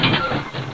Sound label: metal; panzer